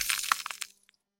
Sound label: demolish